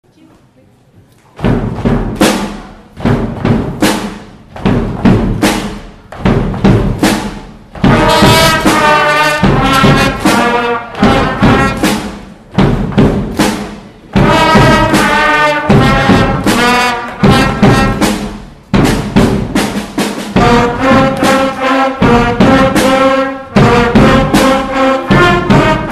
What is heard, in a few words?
Power Rock, Band, Concert Performances; Spring Concert 2010